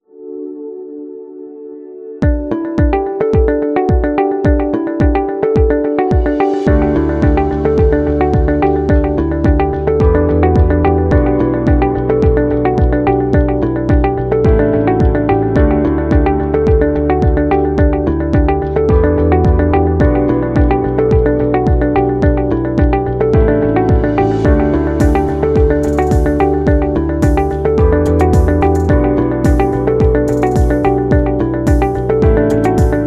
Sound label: Soft Corporate; waiting music